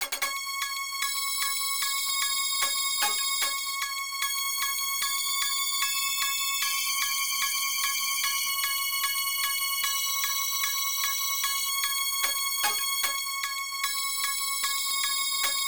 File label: Stage Start; Eco Fighters; arcade; Stage Start Demo (Goyolk)